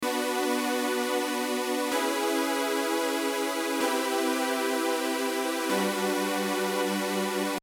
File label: Strings